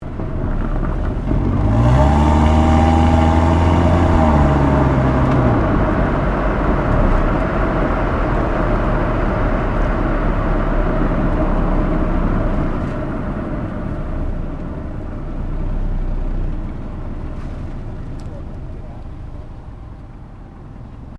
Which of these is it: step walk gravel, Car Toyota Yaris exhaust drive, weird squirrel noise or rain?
Car Toyota Yaris exhaust drive